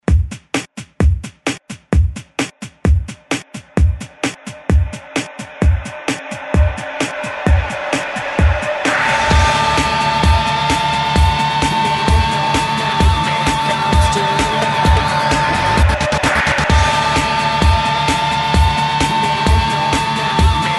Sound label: Rock ReDrum 130 Bpm